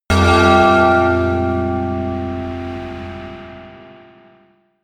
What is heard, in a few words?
melodic church bell tamtam high